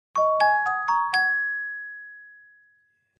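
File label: Clavichord Short Funny; keyboards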